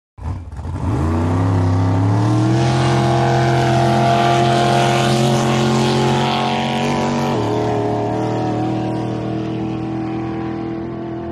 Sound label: Drag Boat Start revs